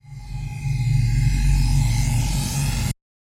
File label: Buzz Charge Up; SoundsCrate